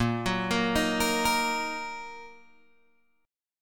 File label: Bb Suspended 4th Sharp 5th, Bb, down, Guitar, Open G